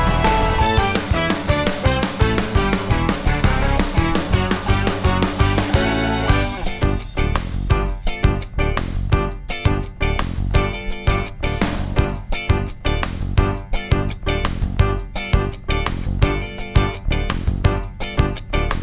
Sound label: karaoke